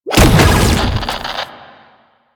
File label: Proto Infested Brachiolyst Teleport Stop; Warframe; Abilities